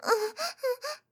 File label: Moan; My Cute Commissar